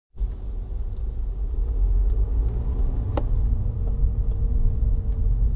f55 when cold tapping noise heard when accelerating a bit and releas; releasing gas; f56; f57